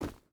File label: footstep rubber boots forest run; Rust